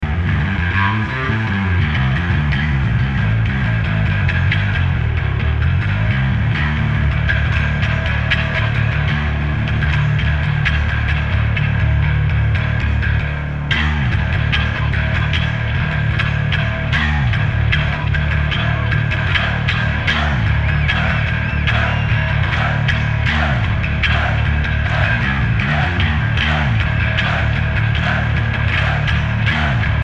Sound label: Bass Solo